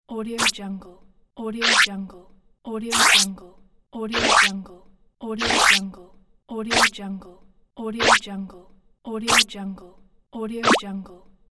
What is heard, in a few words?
swish cartoon; volkovsound